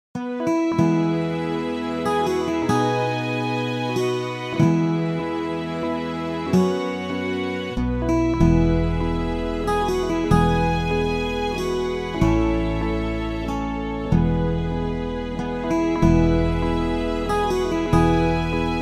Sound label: Amazing Grace instrumental children